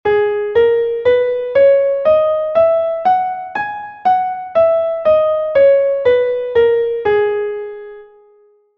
sol sostenido menor natural

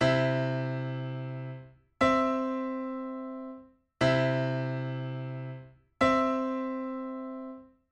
Intervalo armonico decena mayor

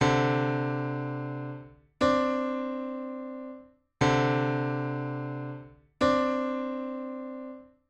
Intervalo armonico segunda mayor

